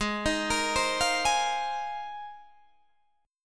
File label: G sharp 6th Add 9th, Guitar, Open D